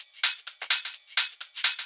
Hihat Loop 128 BPM

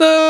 VOX LOO D5#, Scats Choir; 90s Sample CDs; Club-50 - Foundations Roland; Short Scats

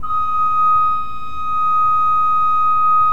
D5, Cathedral Organ Koppelflute; Propeller Island

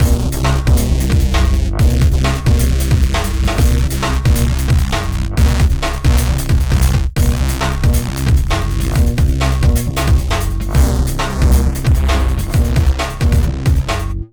Hardcore Breakbeat, 134 Cmin; Big Fish Audio; Hardcore Breakbeat Action

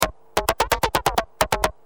Dist, Industrial, Drums Perc

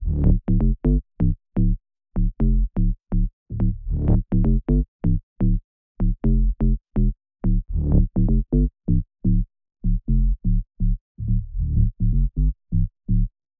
125BPM DRIVE BASS D, Bass Loops

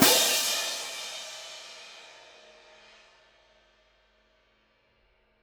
Cym f, Cymbals, Orchestra; 90 s Sample CDs; PowerFX - Downtown Orchestra

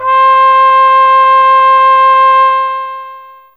Old Horn C4, Fairlight CMI IIe Brass; Brass1

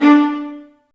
viola marcato, String Sections; Violas; Roland LCDP13

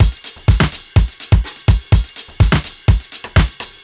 nu house full, Beats, Nu Jazz House, 125

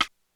Rimshot, Korg DSS-1 Drums

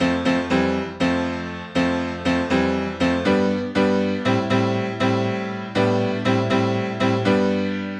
Chord Piano Am, Nu Disco, 120bpm; Zero-G; Extra Chord Progs

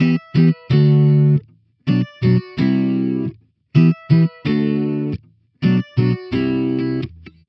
Electric Pop Guitar 128BPM Emin; Vengeance - Essential Clubsounds Vol.4

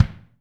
BD 1M R, DRUMKIT

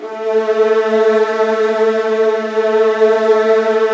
A1, Virtuoso Strings

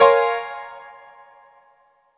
A#m7, Chords; Electronisounds

